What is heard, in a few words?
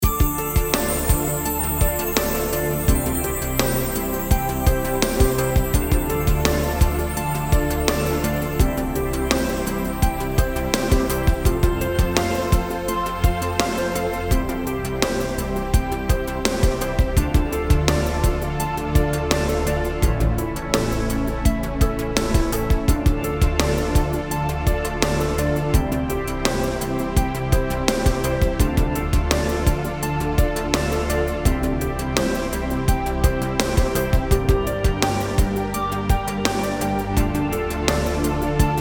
Forest Drama Looping; Could work in a forest-themed RPG or something else I'm sure